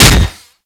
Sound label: Impact Metal, Weapons; Warframe; Furax; Impacts